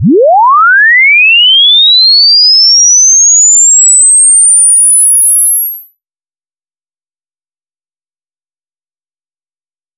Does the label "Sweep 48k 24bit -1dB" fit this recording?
Yes